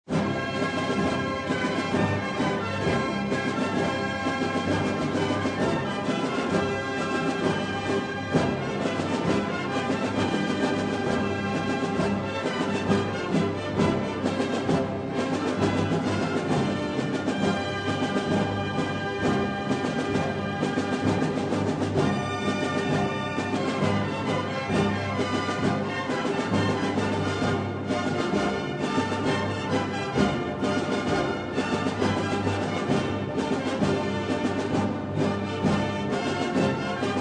bolero; Il bolero è una danza di origine spagnola, in tempo ternario. E' spesso accompagnata dal suono delle nacchere.

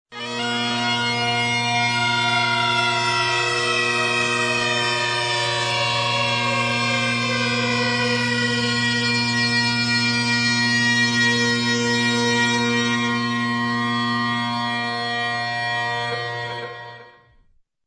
bordone, suono grave e continuo; Per estensione, il termine indica anche la voce, in una partitura polifonica, che "tiene" una nota lunga e grave.

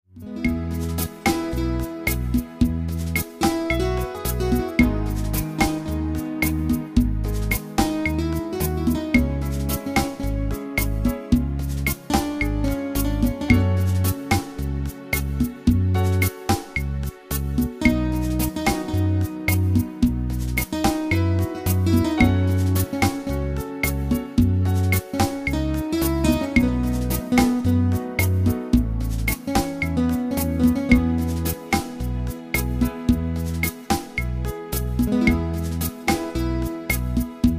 La beguine è una danza caraibica, in tempo 4/4 e ad andamento moderato, dal caratteristico profilo ritmico, come puoi sentire nell'audio proposto.

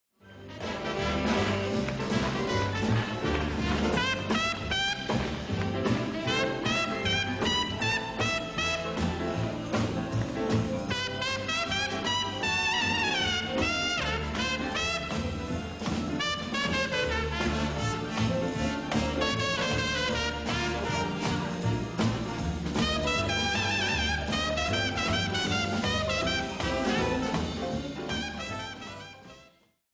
assolo

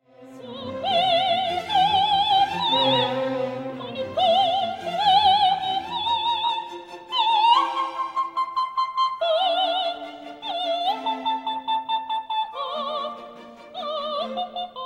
soprano legg lir dramm, voci liriche

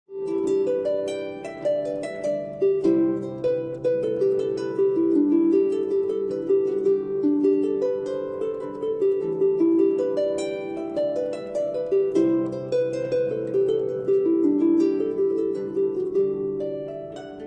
arpa

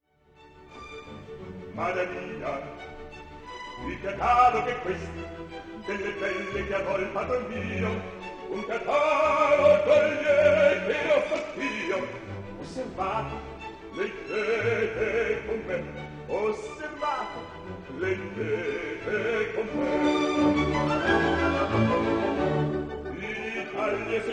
basso; le voci liriche e il coro

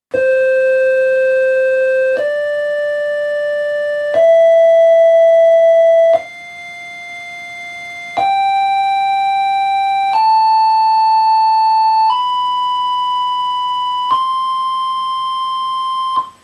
scala pitagorica

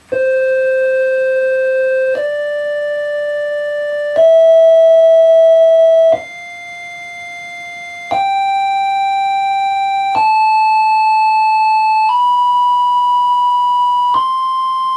scala zarliniana; ideata da Gioseffo Zarlino (XVI sec.), è costruita sugli intervalli naturali dei suoni.

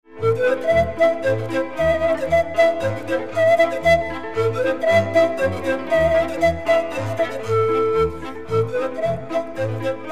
flauto di pan

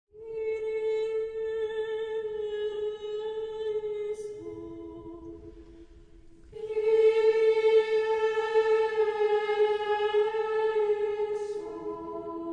canto ambrosiano

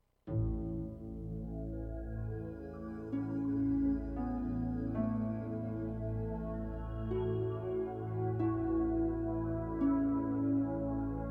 arpa celestiale; Musiche originali di sottofondo (per video, parlato, ecc.)

